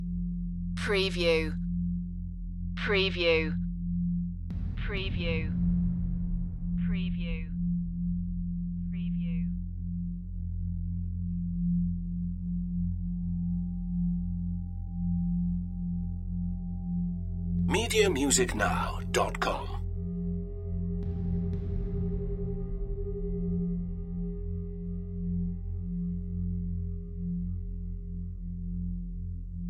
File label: drone and deep drum loop